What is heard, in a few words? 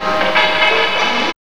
GTR RIF